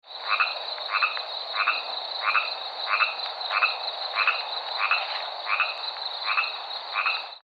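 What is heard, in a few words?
phypochondriaca solo, frogs; This is short recording of one frog calling at night from a small pond in Nye County, Nevada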